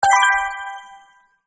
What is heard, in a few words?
win explode